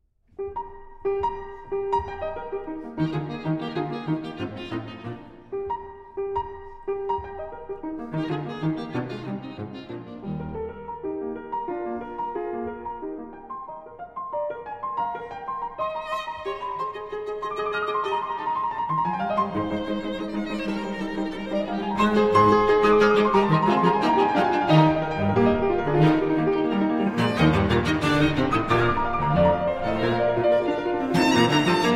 Piano Trio No. 1 in E-Flat Major IV. Finale. Presto